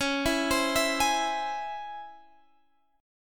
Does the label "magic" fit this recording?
No